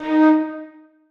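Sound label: flutes staccato d#3; Sonatina Symphonic Orchestra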